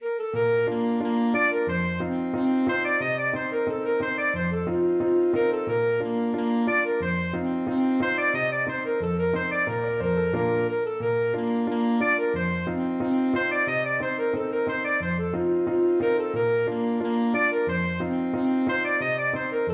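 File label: hornpipe, alto saxophone; bangup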